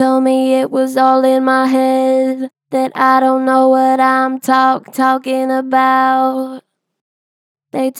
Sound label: Str Flat Told me it was all in my head, Vocals, Pitch Flat Strong; Harmor Vocals